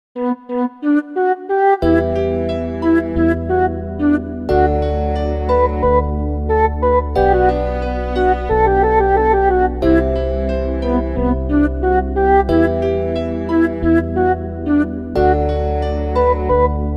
flute instrumental version ringtone

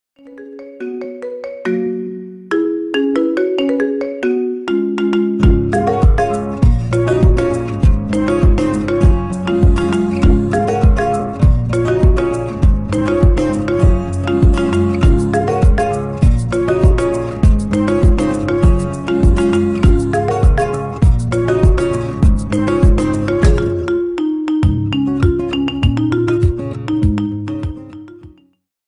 marimba; bacerlona

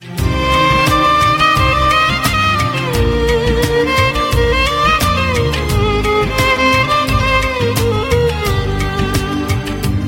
Sad Violin Instrumental

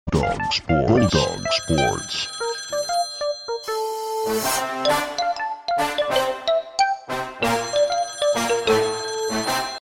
iphone special music

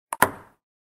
huawei ringtones

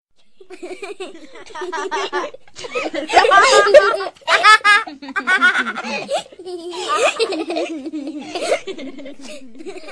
kids laughing

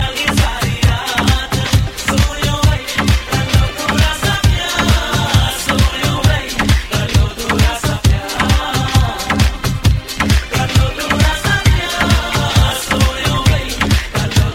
bollywood hip hop

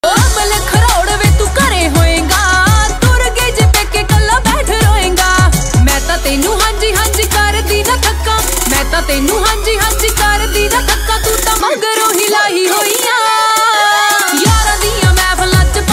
Punjabi Song

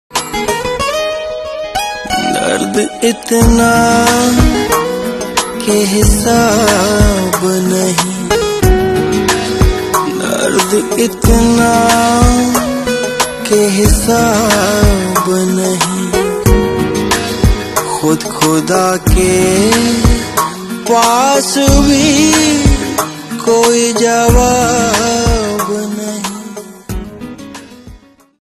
punjabi sad song ringtone

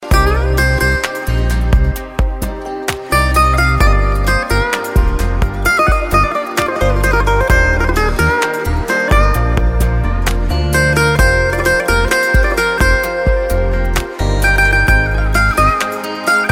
romantic guitare

